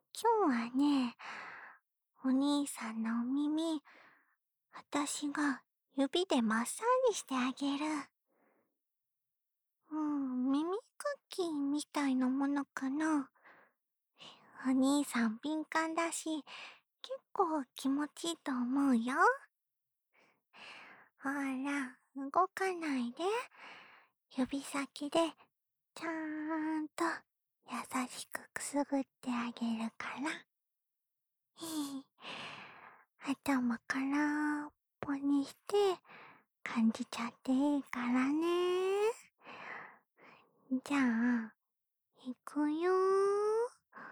安眠用マッサージ（右耳）, ASMR

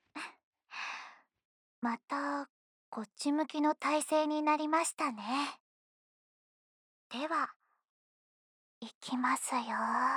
耳吹き、右耳、夜の風

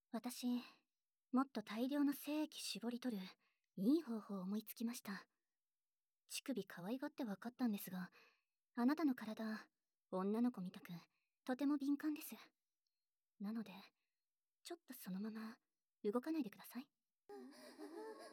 耳舐めとオナホこき; SEあり

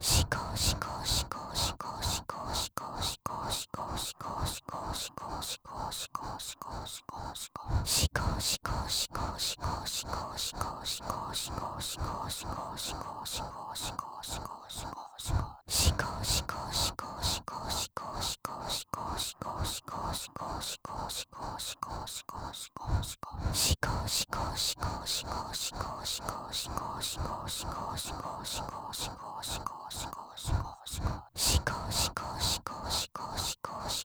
シコシコ ハッハッ 普通

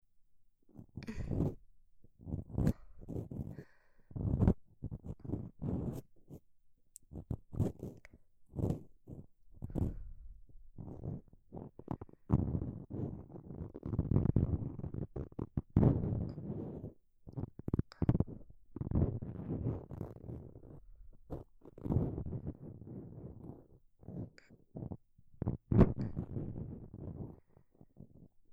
耳かきで焦らしタイム（左耳）; ASMR

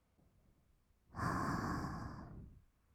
吐く音, ブレス, kawaii voice; ASMR

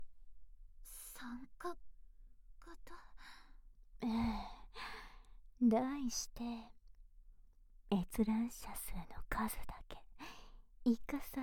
ねっとり耳舐め責め, ASMR

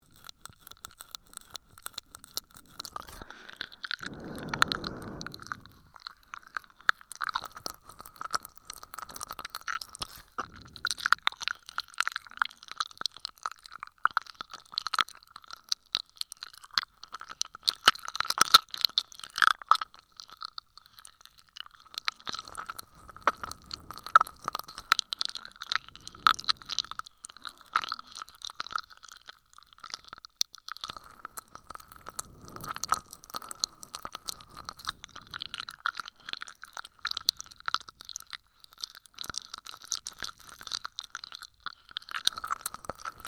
耳舐め音

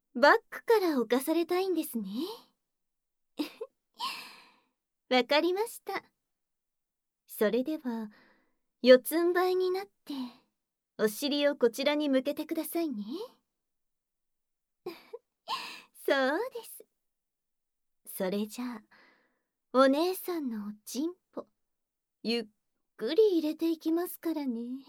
効果音なし, 耳舐め・ささやき; バックから乱暴に責めますね。; バックから逆アナル・乳首責め・手コキ

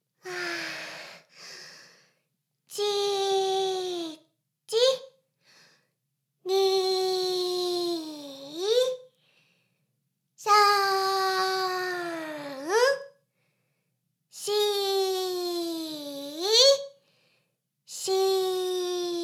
シコシコ音声; ダメなメイド

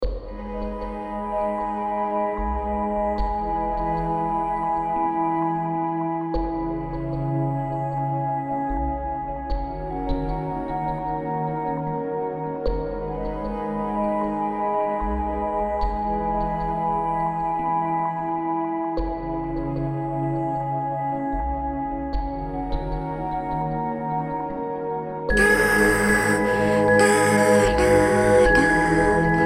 子守唄 アレンジバージョン

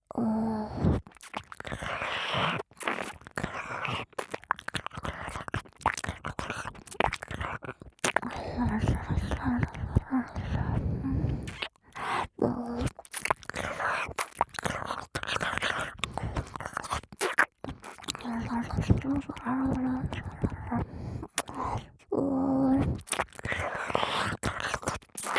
激しい耳舐め 右

